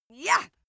female attack

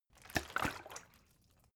bottle water sparse upend slosh; berufe

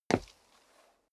step sneaker wood parquet slide long

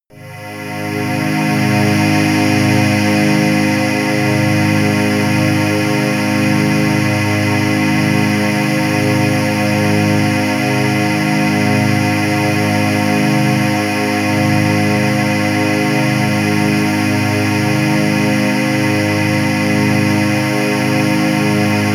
piped air, music elements

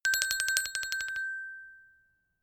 glockenspiel tremolo high short

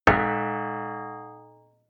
plate hit ring stopped dissonant; hits impacts

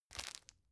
tissue grab soft; objekte; taschentuch

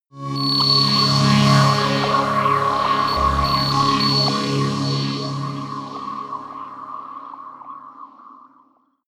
sci-fi new galaxy, drones

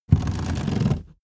car seat slide backward fast rattle

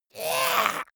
monster assault high; schreie

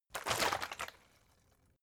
bottle water big shake single; berufe

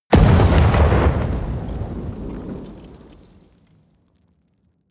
explosion large dirt fall; explosionen; comedy-cartoon; soundpool

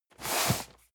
cardboard box medium slide hard; objekte

